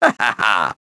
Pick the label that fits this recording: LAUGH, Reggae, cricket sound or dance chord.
LAUGH